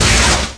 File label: Lazer